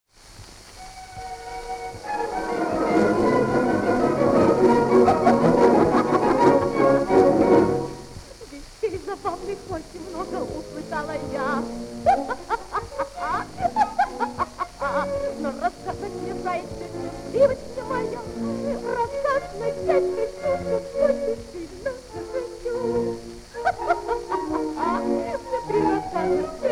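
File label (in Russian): Smeh, laughter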